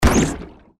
diving game hit; open toontown resources

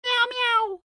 cat med; open toontown resources; dial

